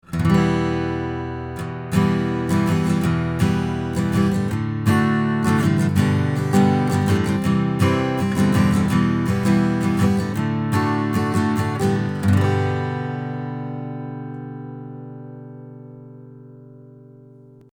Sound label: Acoustic Guitar very close; Lewitt 240 Pro